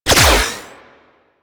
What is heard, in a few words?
Beam Shot Fire; Glaxion Beam Moa